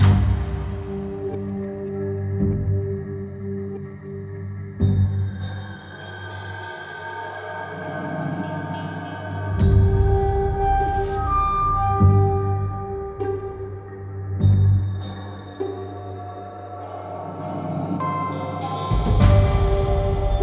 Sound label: music; 3-1 area 1